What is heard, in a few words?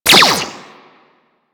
Burst Laser Pistol Fire; DE; Warframe; Weapons; Sentinel